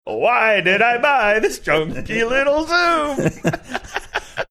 singing why did i buy this junky little zoom

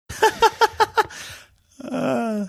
chuckling sighing

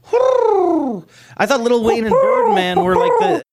bird sounds

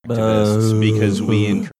groaning

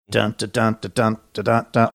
singing portion

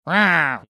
making cat rawring sound

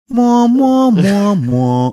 singing iconic notes; close encounters third kind

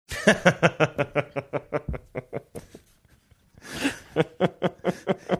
chuckling

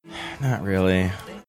sighing not really

